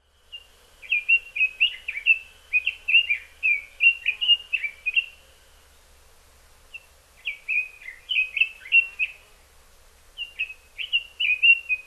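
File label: red-billed leiothrix, hyogo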